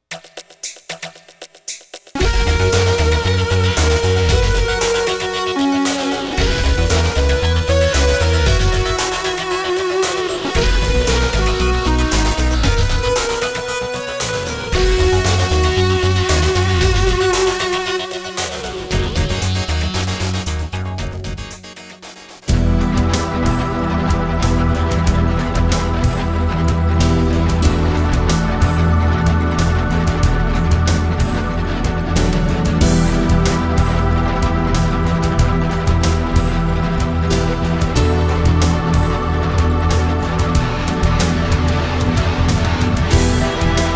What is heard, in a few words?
Podcast Music